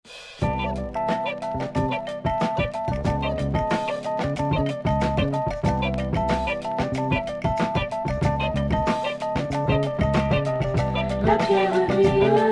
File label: samba; groove break